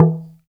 TALK DRM, Heart of Africa; Spectrasonics

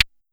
Pollard Perc, Syndrum

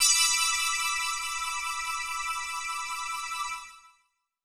125 pad E, Keys, Nu Jazz House; PowerFX - Nu Jazz House

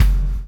CHAIN; Spectrasonics - Backbeat

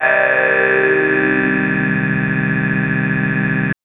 Synth VoiceSweep min c2; TrashLibrary

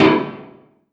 Oneshot; Sonic Foundry (Sony Creative Software); Esoterik Beatz; Spitter

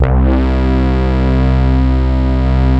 ARP, Analog Fantasies; BIG RASS; USB Soundscan vol.49; AKAI

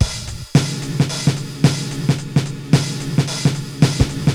80s Gated Verb Korg MR-16 (110BPM), cyborg beats

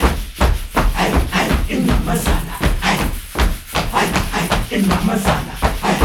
80, Group Chants, E Toi E Toi; Spectrasonics; Supreme Beats; African Contemporary

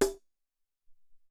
Hat Cong

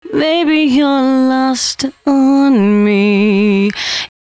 113bpm C#, Velvet Vocal; Zero-G

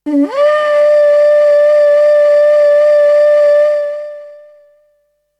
Flute; ZERO G - Datafile DISC 3

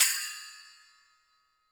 HiHat & Crash, Roland TD-20; HiHats

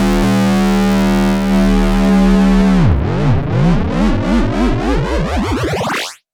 funk bass one-shot; KJ Sawka - Drum n Bass & Beyond

